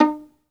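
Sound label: SOLO VIOLIN, Synclavier Strings; ILIO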